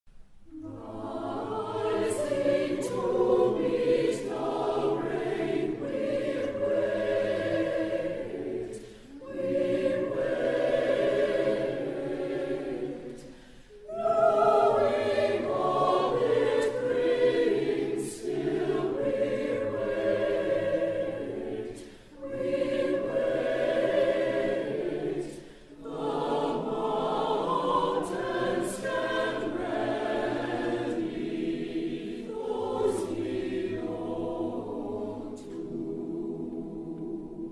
Senior Choir; Savior's Lutheran

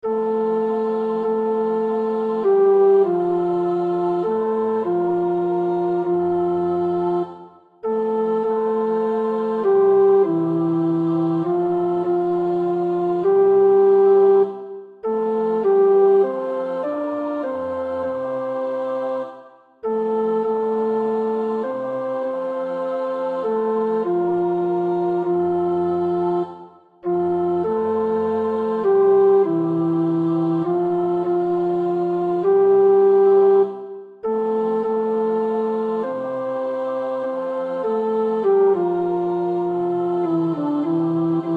tenor; Gesius (Bartholomaus); Gezang 389

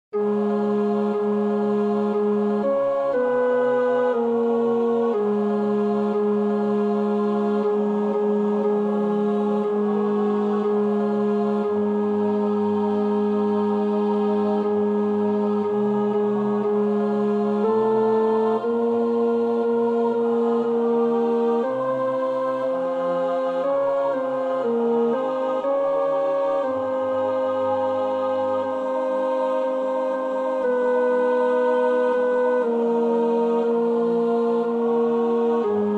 The Lord's Prayer (Alt), Oefenbestanden

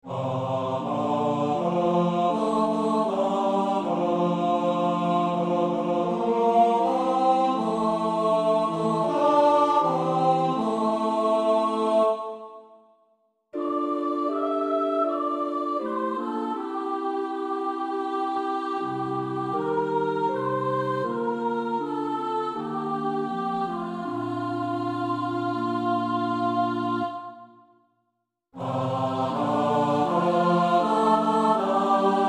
S-A-T-B; Darke (Harold E); Communion Service in F; Kyrie

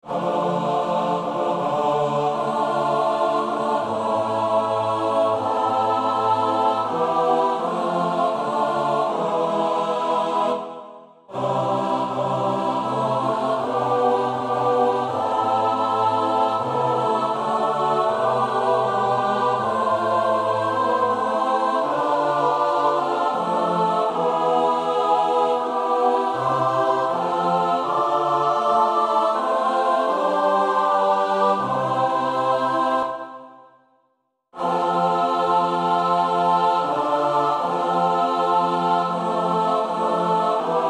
Vereily, verily I say unto you (S-A-T-B); Tallis (Thomas)

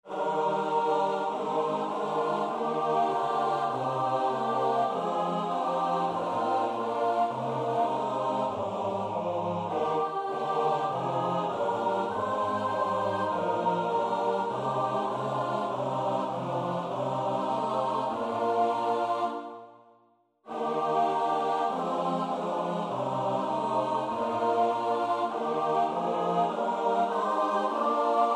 S A T B; Alles wat over ons geschreven is

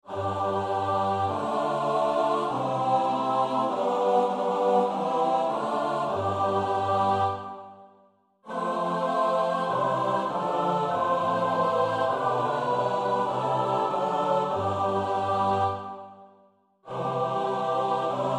S A T B; Le Jeune (Claudin); psalm 30 gesplitst

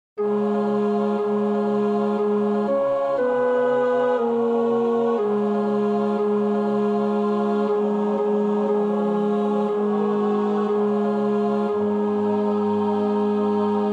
The Lord's Prayer (Tenor), Oefenbestanden

